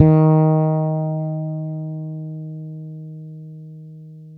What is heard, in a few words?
bass, D#4; Spectrasonics; Bass Legends CD 1